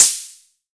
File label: SERGE Hat Combo, drums; Polyend; Stazma & Concrete Collage; Concrete Collage Drum Kit; Concrete-Serge Drums